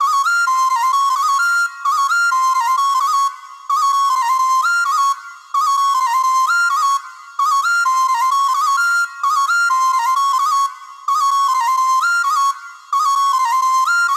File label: Angelic Flute Loop BPM 130 A Min; Bihu; waves of bihu vol 2; Gully Loops